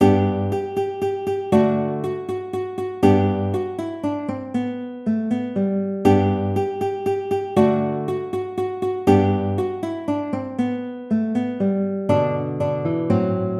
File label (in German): alter ungarischer tanz